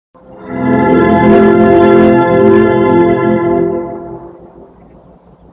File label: roar; stier